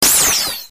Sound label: magic